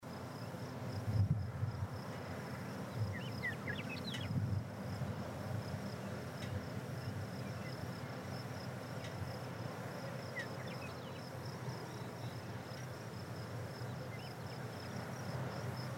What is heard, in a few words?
birds filter rumble, montana